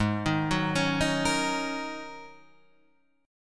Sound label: G sharp 9th Suspended 4th G sharp